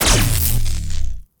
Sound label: Scifi Forcefield Impact; Energy & Forcefields